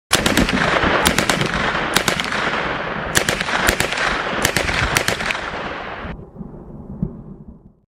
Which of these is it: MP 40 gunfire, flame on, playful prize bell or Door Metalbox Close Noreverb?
MP 40 gunfire